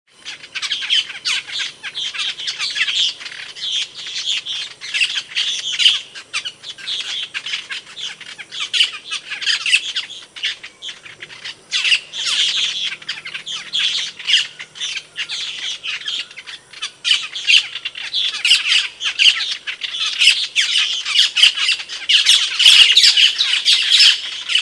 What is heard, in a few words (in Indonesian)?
Suara Burung Cucak Keling Teler Gacor